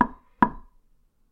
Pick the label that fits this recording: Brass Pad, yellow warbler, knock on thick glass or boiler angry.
knock on thick glass